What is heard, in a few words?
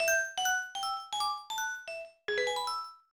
Unused Jingle (Music Box); Pokemon Mystery Dungeon Blue Rescue Team